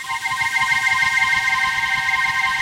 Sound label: FLUTTERERS; Pro-Rec - Synthsonic